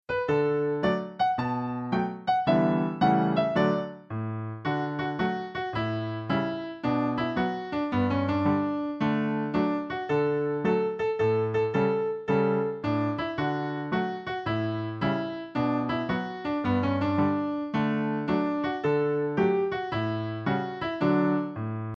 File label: waltzing matilda instr